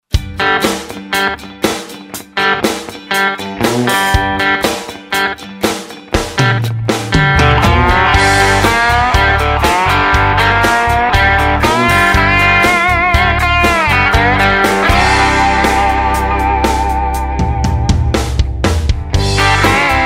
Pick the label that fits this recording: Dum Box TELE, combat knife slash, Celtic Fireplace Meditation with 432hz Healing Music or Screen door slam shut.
Dum Box TELE